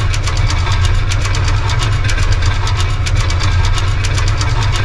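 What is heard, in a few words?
jump intro platform loop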